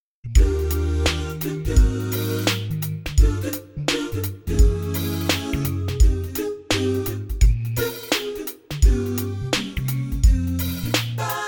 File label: smooth